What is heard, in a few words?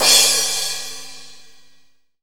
CRASH, CYMBS; Zero-G; Funky Elements